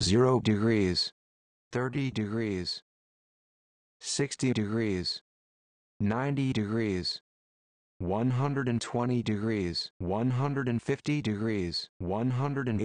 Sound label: 360 surround test, Multichannel